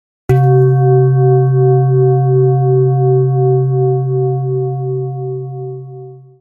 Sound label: gong; zenflow